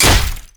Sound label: basicattack human; LoL; shyvana